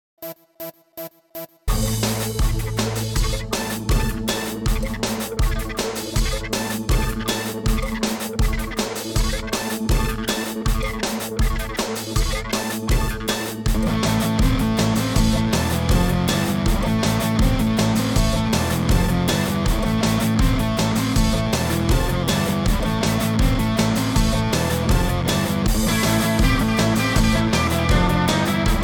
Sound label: Digital Gold Guitar